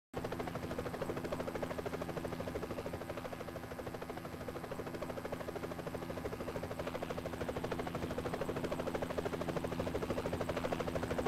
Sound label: helicopter